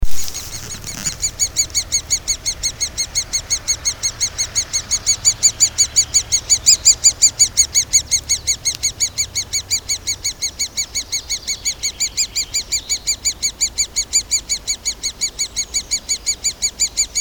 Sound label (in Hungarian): nagy fakopancs hangja